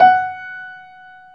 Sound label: PIA GRS, PIA_GRAND, Roland; Sound & Vision; Gigapack I CD 2; PIA Grand 4